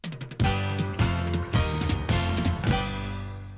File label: topformat maxi jingle single